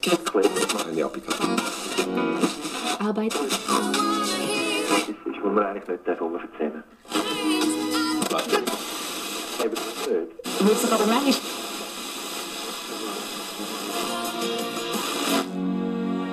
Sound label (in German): Radio Suche